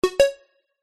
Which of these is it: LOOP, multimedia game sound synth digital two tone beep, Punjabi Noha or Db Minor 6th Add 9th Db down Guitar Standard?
multimedia game sound synth digital two tone beep